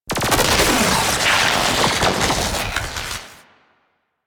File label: Shock Blast Straight Open F; Warframe; Corpus Gas City; Ropalolyst